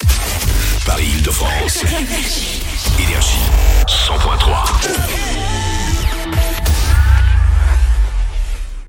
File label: JINGLE; PARIS, 100.3 FM; NRJ